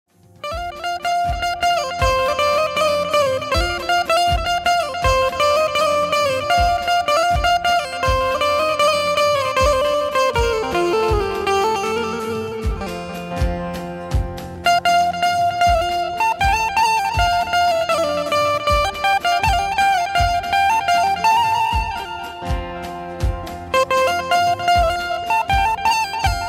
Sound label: gujarati banjo ringtone